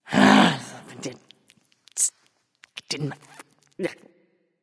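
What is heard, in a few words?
grumble, female; smite; voicepack; hera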